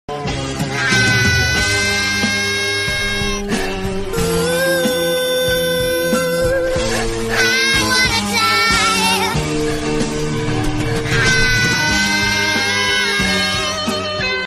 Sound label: aah